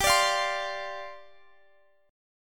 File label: G 7th Suspended 4th G down Guitar Standard; strummed; capo 9